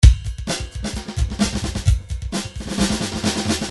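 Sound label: drums 130 pattern; Paul Kodish Dangerous Drums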